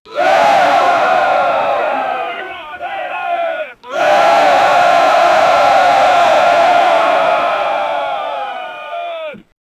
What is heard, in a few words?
giant orc army chanting